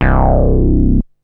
BASS; SoundFactory; SMPMANIA

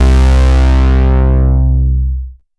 Synth Bass Single Note; Basses

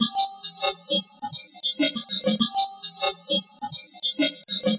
Electronic Percussion

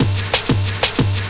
Old School Break, Drums

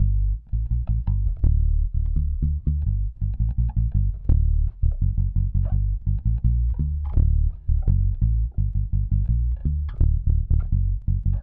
Electric Bass; Basses

